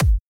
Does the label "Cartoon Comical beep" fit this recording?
No